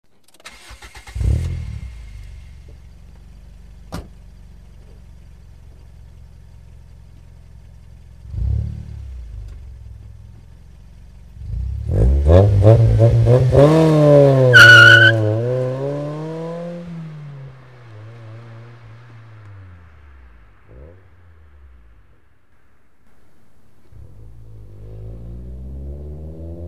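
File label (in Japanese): legal, 92LEVIN+HKSリーガル スタートダッシュ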